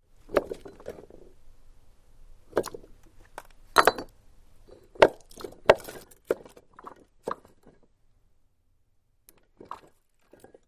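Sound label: Ceramic Rock Movement, rocks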